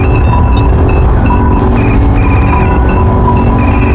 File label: atmosphere; KATANA; Daikatana